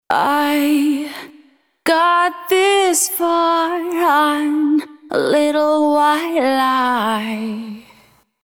Vocals WET

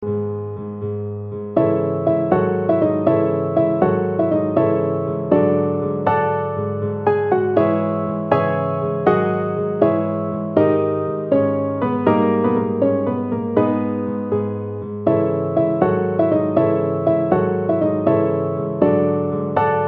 piano; sampletank Free

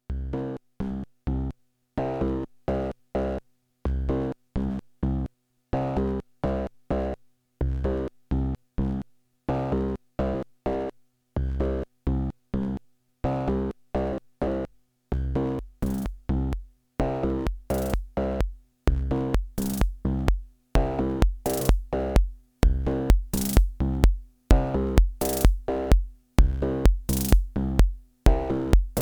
KASTLE MONOTRIBE

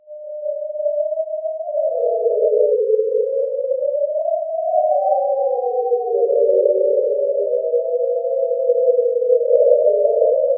Flamesound

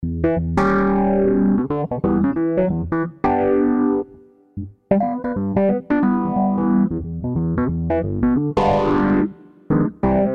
EZKeys Electric MK1 1970s

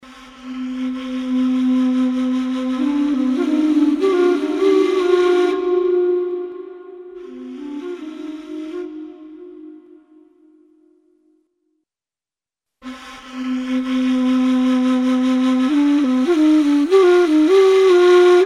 epic world reverb; bestservice

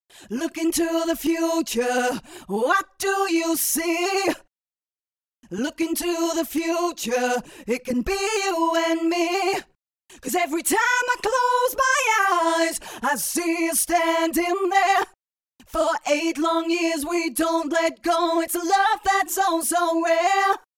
Autotune EFX Duet Loose Double; Antares

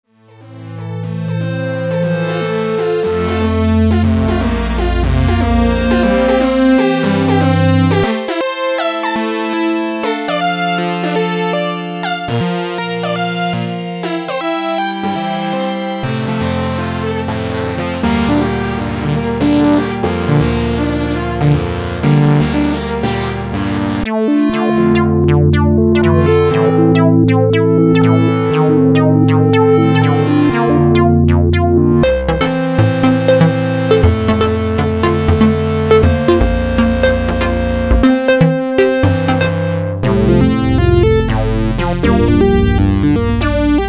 Modular

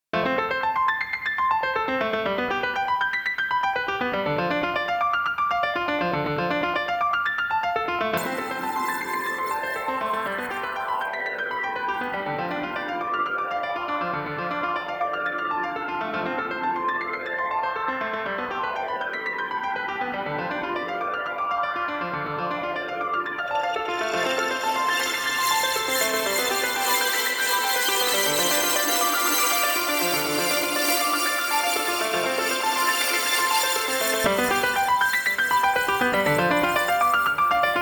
FINISHER NEO Piano; UJAM

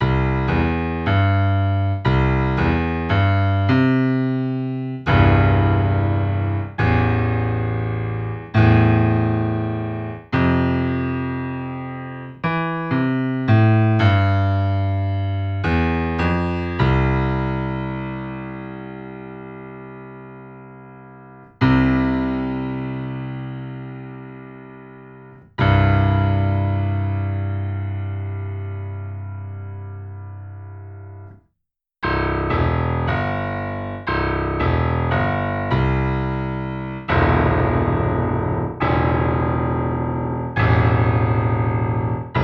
Basstest Bechstein

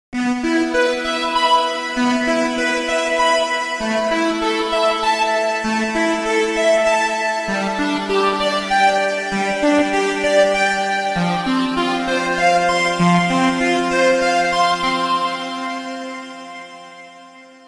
Vocoder Plus ARP; XILS

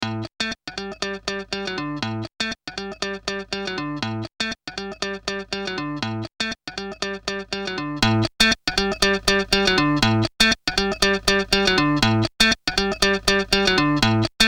Dist Tube Culture Guitar; ARTURIA

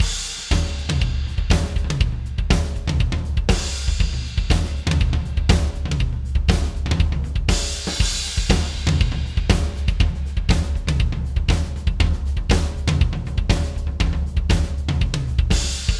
subFilter DRY

